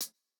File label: Jrock hihat